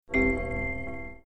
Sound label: Tech Ding; Sid Meier's Civilization 4 Complete; Beyond the Sword; FfH Age of Ice